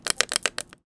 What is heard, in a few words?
Foley